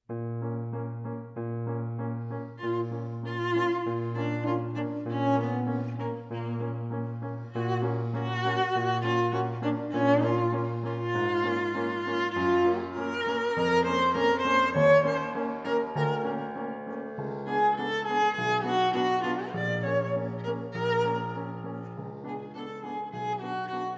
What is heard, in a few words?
Cello and Piano; 24 Preludes for Cello and Piano, Op. 178 Prelude No. 4 in E